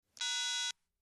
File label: Cell Phone Asterisks